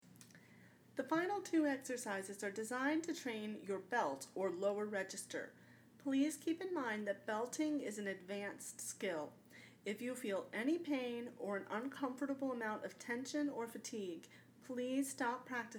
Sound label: singing belt